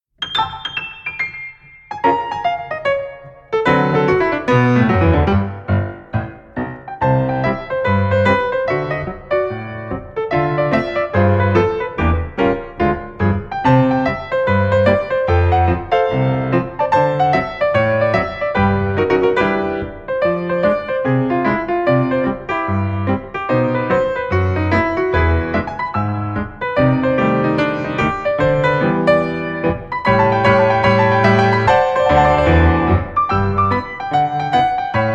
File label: Happy Uplifting Music, Piano